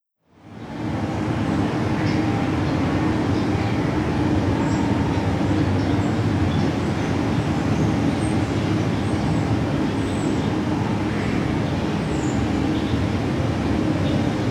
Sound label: nyc Park